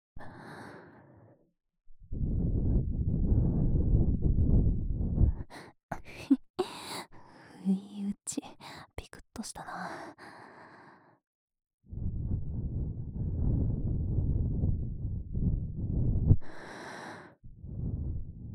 右 耳ふー, ASMR

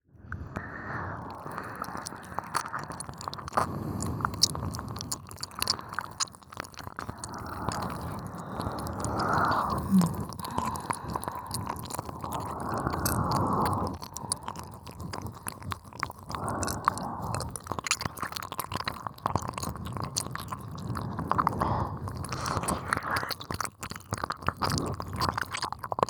耳舐め, ASMR; 怖ギャル

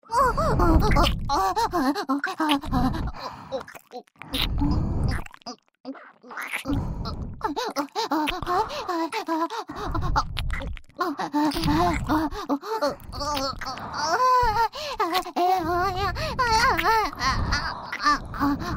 オホ喘ぎ + 両耳キス; ソフィ＆エミィ

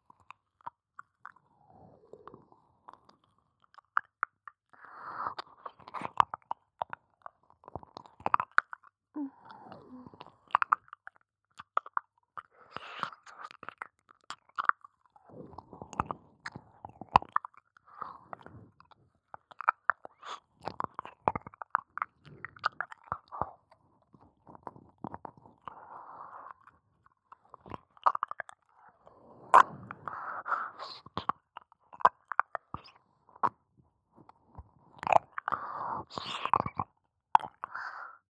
耳舐め・普通 右

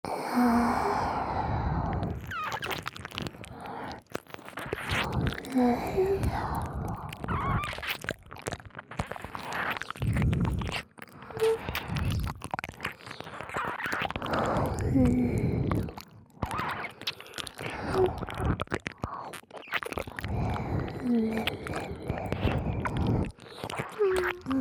両耳舐めループトラック, ASMR; パパ活JKに精液もお金も枯れるまで絞られる話

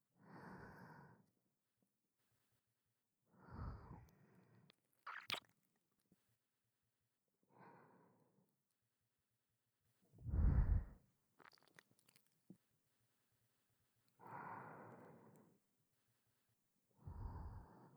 おやすみ前の安眠耳キス

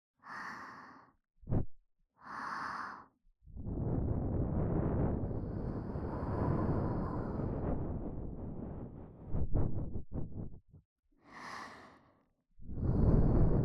【左】耳ふー, 耳かき, ASMR; 耳かき小分けトラック

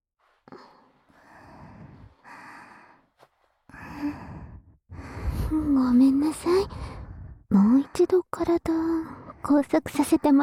両耳で くちゅくちゅ オナニー; 親愛の証の両耳キスから始まる性癖歪ませ学園性活; 耳元密着距離

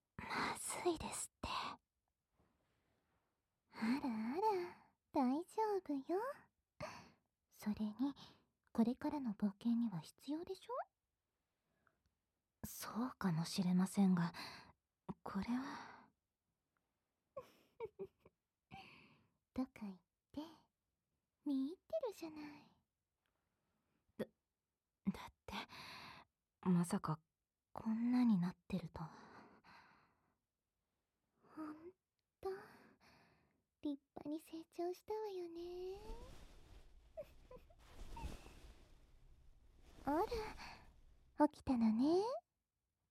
耳舐め、囁き, ASMR; 冒険当日の朝; 全肯定、Wフェラチオ、淫語; Wママと僕のえっちな大冒険!

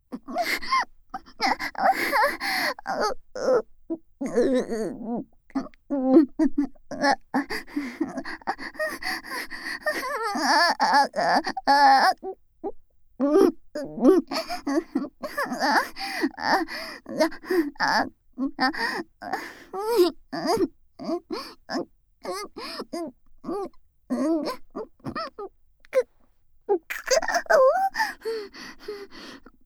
甘オホ喘ぎループトラック, ASMR; エロ舌JKアスカちゃん; 学園耳舐め裏リフレ

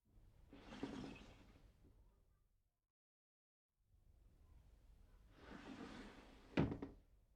夜もお相手します！（全身マッサージ＆マッサージキス）, ASMR; つきっきり温泉旅館～癒やしづくしの1泊2日コース～; プレミアムフォーリー

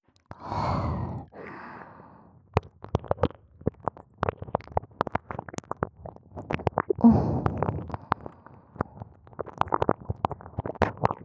耳舐め（右）; 無感情なアンドロイドの寸止めオナサポ

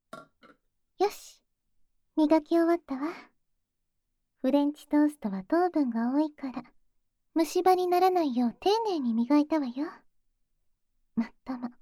耳舐め 手コキ SE大, ASMR

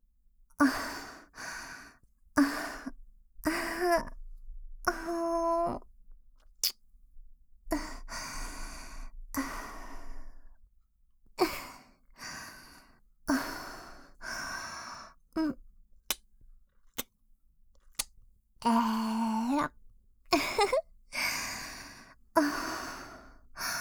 キス; マゾハーレム

